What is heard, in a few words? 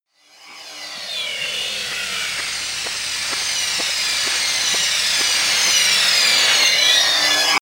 techno beat high